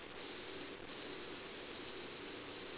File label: cricket; valve; ambience